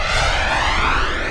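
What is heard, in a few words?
force blast loop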